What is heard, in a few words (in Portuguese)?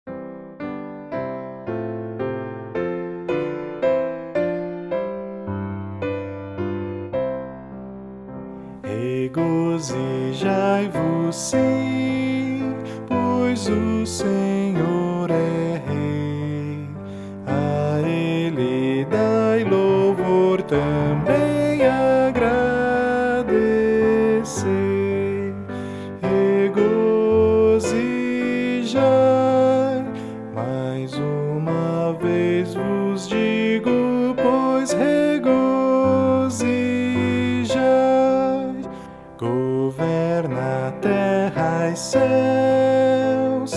voz piano